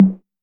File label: TR808 Tom, Roland SH-32 Drumset